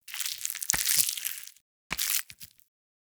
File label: Volatile Runner Taunt Flesh; Enemies; Abilities; Warframe; Proto Infested